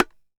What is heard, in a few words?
Bongo Fingerslap Hembra; Best Service; Festa Latina VOL-1; Single-Hits